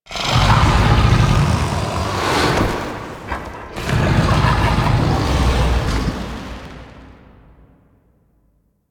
Idle Noble Unarmed; Warframe; Voruna; Idles Noble

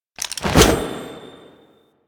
Grenade Throw; Warframe; Quests; The New War; Kahl; Abilities

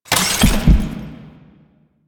Sniper Aim In; DE; Warframe; Warframes; Frumentarius; ExaltedSniper

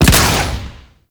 Chem Strike Nox Rifle Shot 3D

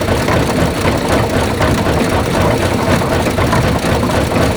Gear Loop; ambience; Warframe; Grineer Galleon

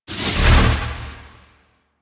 storm ground slam; Warframe; Warframes; Ninja

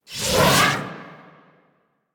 Radial Javelin Sword Cast; Warframe; Warframes; Excalibur Umbra

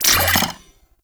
Droid Array Equip, weapons; Warframe; Cephalon

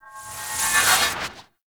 Melee Heavy Attack Large Charge; Warframe; Weapons; Whooshes; Heavy Attacks

